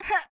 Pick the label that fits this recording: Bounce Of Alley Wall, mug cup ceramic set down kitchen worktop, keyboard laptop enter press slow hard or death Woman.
death Woman